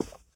carpet step; Golden Crayon Sounds

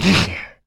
monster hit; Original TK Sounds

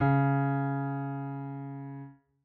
piano c1#; Golden Crayon Sounds

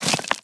wall rocks; Golden Crayon Sounds

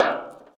bleacher step; Golden Crayon Sounds

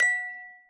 correct; Business skieme Beta 1

